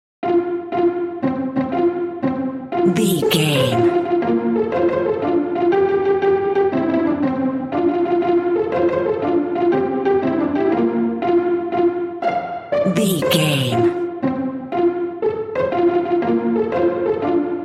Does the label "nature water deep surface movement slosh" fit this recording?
No